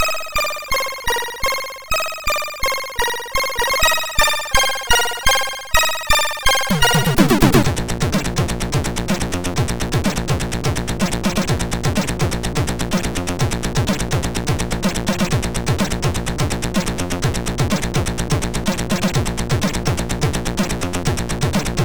Intro Music II, MOS6581R4

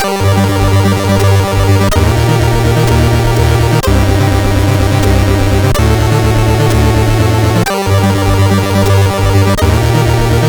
Tetris, sid CSG8580R5

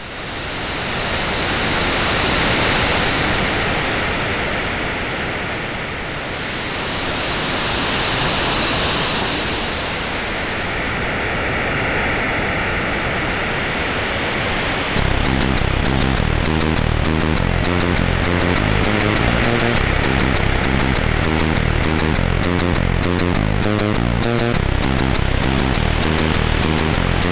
Sea Music, MOS6581R3

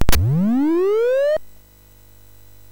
FX, MOS6581R3; HVSC; Software House

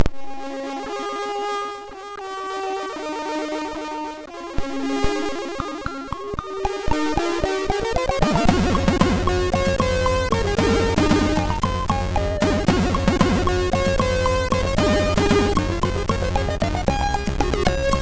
Orch Intro

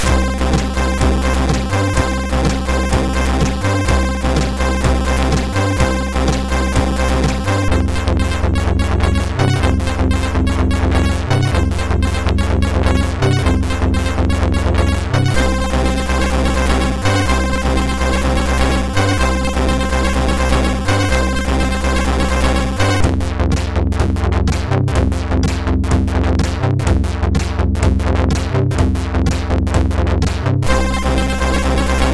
sid MOS6581R4

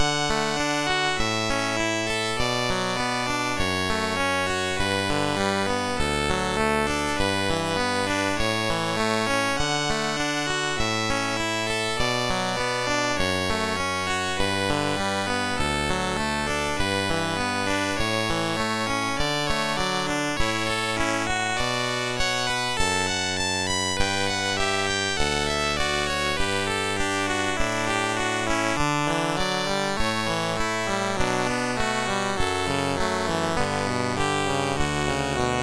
Canon, MOS6581R3; Dave Burkus

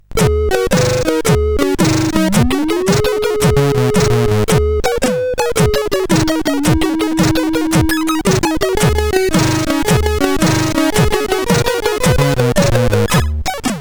Drop Climb, CSG8580; CSG8580R5; hvsc

